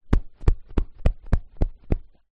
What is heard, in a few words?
household cushion pats; zapsplat